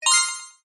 multimedia game tone sci fi futuristic beep positive